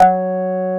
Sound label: Korg CX3, C 1